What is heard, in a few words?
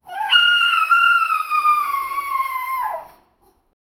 whoosh of air as dog, breaking glass